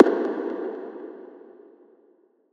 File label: pop cave